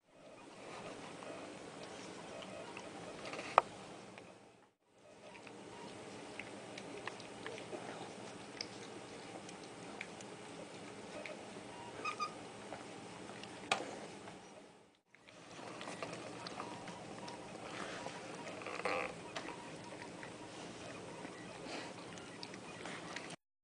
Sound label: puppies